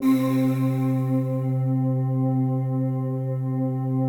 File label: PAD, DIGI-PAD; Best Service; XXL 800 Pads